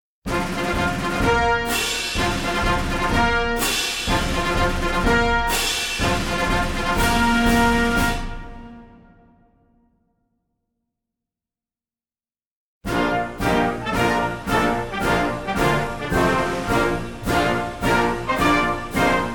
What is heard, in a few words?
Four Ruffles And Flourishes Pause And Hail To The Cheif Complete Version